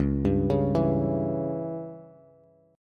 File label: C sharp Diminished C sharp down Bass; C#dim arpeggiated; JGuitar